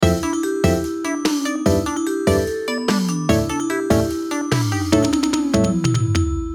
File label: Short mistery